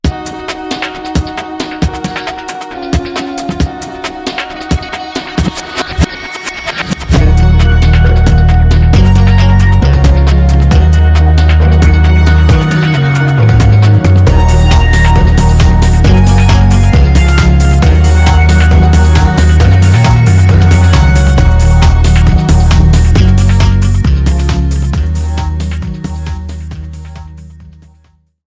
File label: Oppo All F Ringtone